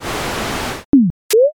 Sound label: gacha roll